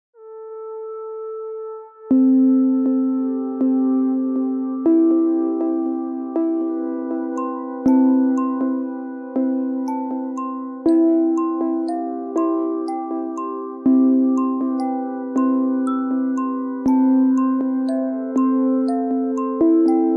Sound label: Background; EUDESIGN RADIO